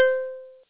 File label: ding